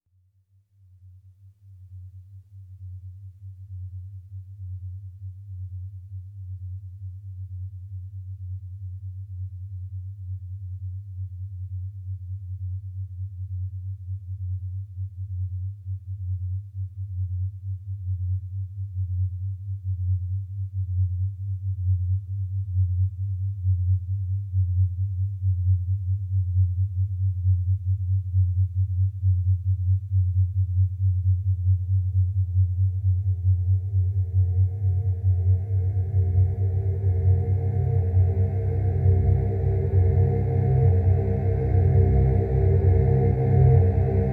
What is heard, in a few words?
Meditation Music, Theta Waves, Subliminal Messages; Brain Sync; Super Learning